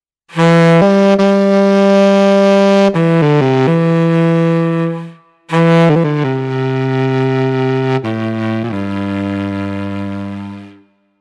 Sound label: sax monster